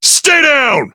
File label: Axe Kick; BBTAG